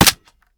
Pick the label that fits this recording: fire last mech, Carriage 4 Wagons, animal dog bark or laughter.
fire last mech